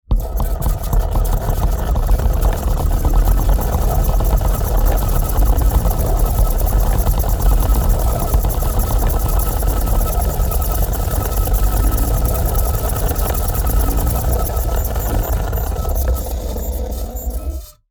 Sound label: Sliding machinery rotating belt